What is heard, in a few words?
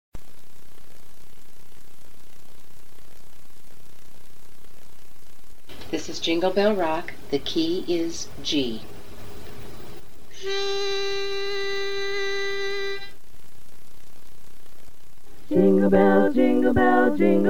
bass; holiday music